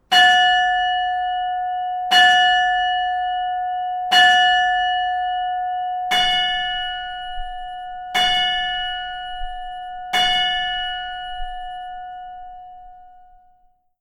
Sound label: Fresh & High clapper, flutes